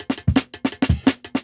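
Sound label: Jungle Breaks, 167 bpm; Original Jungle Breaks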